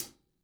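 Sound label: hats closed; Sonic Reality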